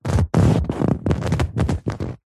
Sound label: household chair sofa couch leather body movement shift leather creak; zapsplat